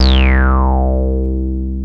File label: TB BASS; TB-303